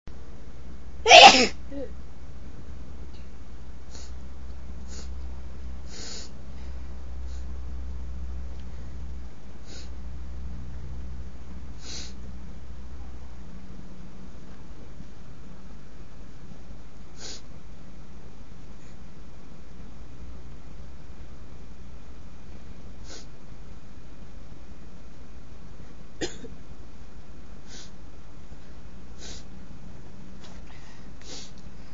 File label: lots of sniffling, female